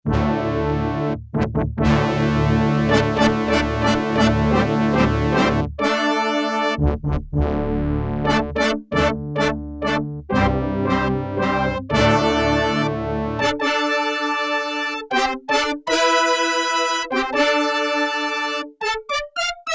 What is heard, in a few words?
Power Brass Ensemble, Yamaha TX802; Performances; Factory Patches